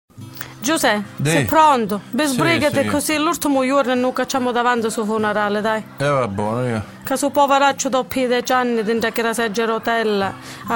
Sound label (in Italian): Funerale calabrese